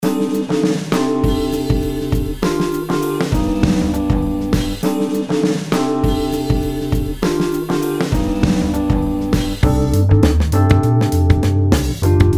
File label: JC beat